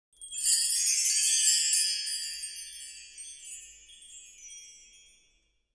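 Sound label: wind chime up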